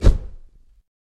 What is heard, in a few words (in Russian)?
zvuk ischeznoveniya